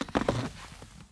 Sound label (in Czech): poposednuti; Mafie 1